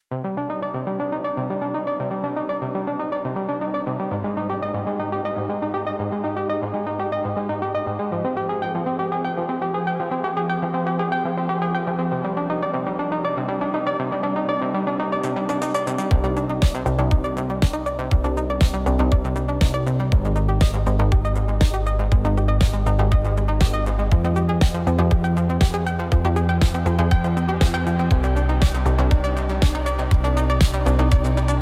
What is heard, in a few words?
Electronic Impulse